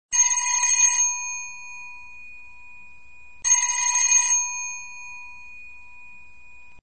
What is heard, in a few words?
door bell